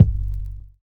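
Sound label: bass drum hip hop; DRMKIT; E-Lab; X Static Goldmine 2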